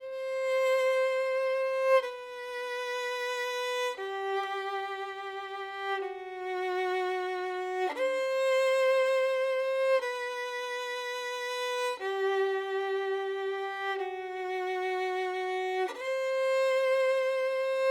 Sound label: violin